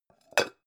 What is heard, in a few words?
pan close chink soft